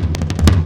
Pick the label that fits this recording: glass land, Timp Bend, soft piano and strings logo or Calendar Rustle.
Timp Bend